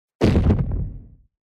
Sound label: Superhero inbound